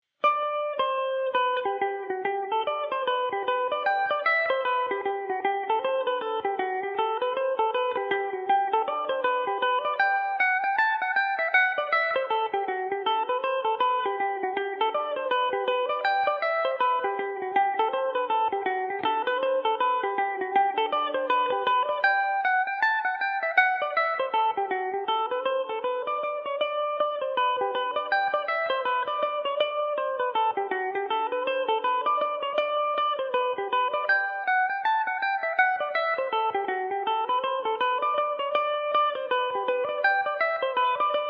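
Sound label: G major revelation, Irish mandolin; avonmore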